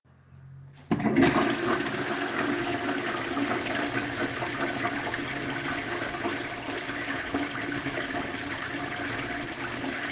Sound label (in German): Klospuelung